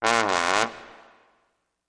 Trombone Low Gliss Down and Up

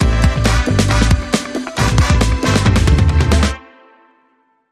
Bumper

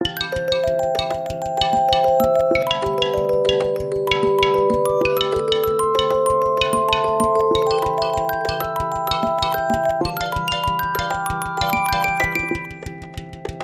Suspenseful Marimba

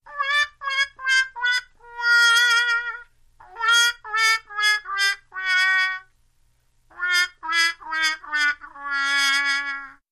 Trumpet Mute Wah Wah Down

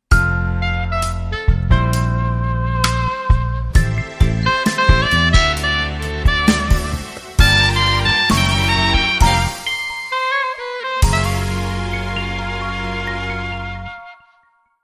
Sax Life

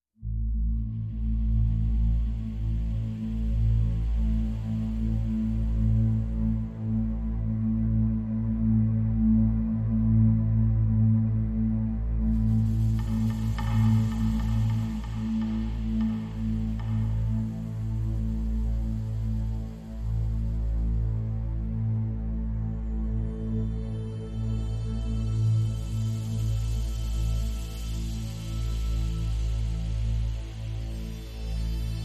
A minor No Guitar

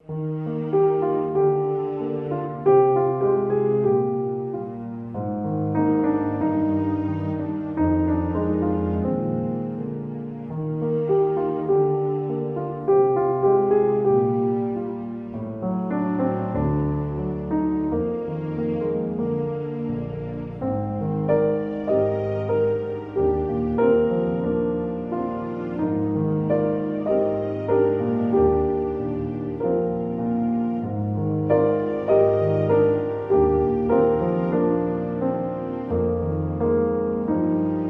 With Strings

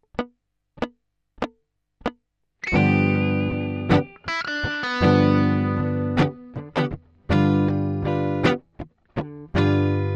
Blues Guit

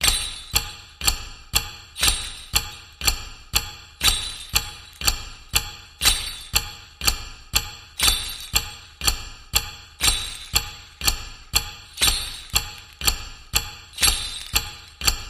Holiday Clock

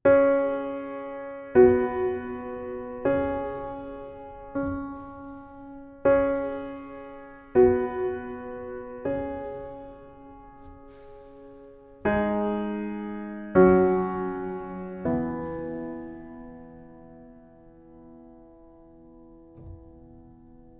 Low Piano Only Mix

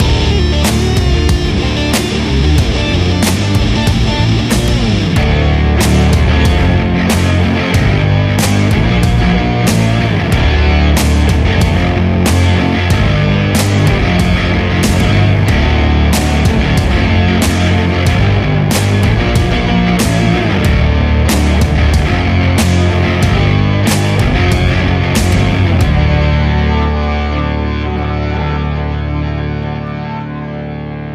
Claps and Rock

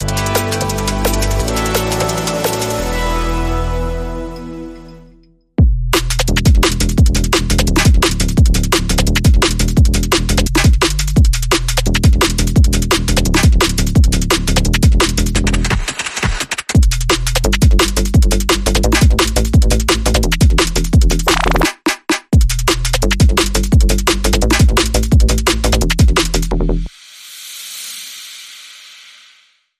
Breakbeat, Rhythm

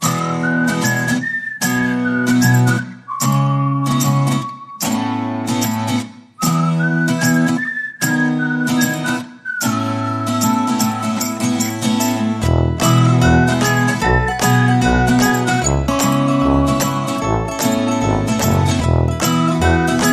Whistle And Bounce

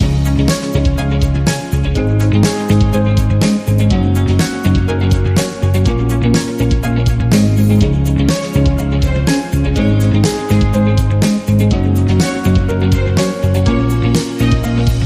Positive Team Motivation

